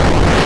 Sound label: boulder crumble; project hawk